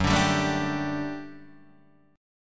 D Suspended 4th F sharp, guitar

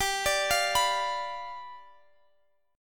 G 7th G

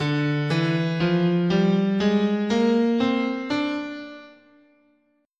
D Locrian sharp2, scale

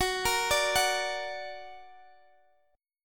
F sharp Augmented F sharp

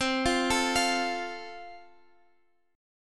F Major, guitar chord

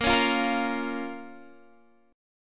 A sharp Minor

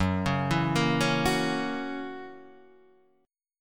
F# 7th F#, chord

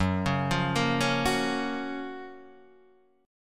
F# Major 7th F#, chord

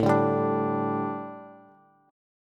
A 7th Suspended 4th, A, down, Bass

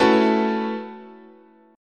A 6th Add 9th, chord, guitar

